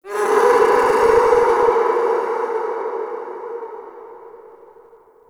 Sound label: Interior Texture Painful Screech, Infested, Ambience; Misc Indoor